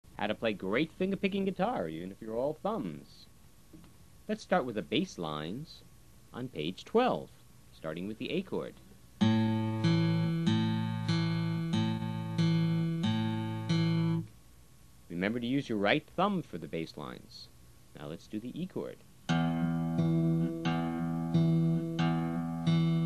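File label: Basslines, finger picking guitar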